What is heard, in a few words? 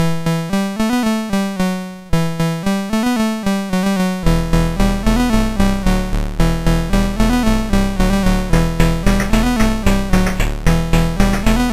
New Song, MOS6581R2